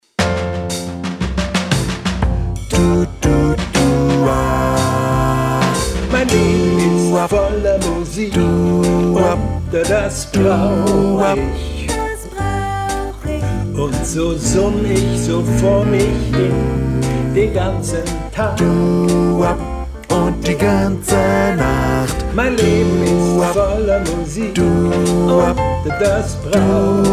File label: Bass